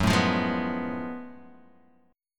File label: F sharp Minor Major 11th, Guitar, Half Step Down